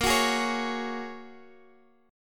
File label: Bb Minor 7th Sharp 5th, Guitar, Standard; x,x,8,11,9,9; strummed; chord shape